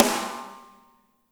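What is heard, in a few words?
Medium Snare, Slam Kit; Spectrasonics; Burning Grooves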